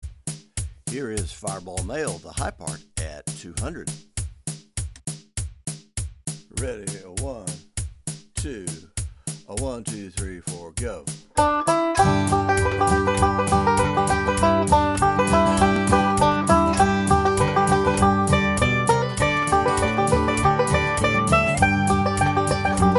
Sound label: banjo